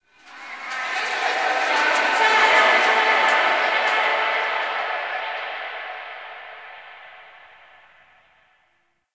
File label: Memory Echoes; Ambience; Zariman; Warframe; The New War